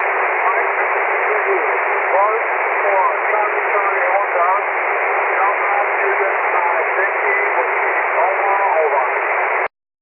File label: ssb, 23cm